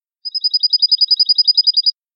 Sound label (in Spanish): cascabel